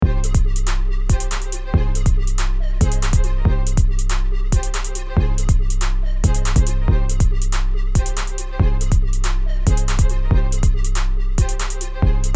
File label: Grime Full Beat Loop; rap; instrumental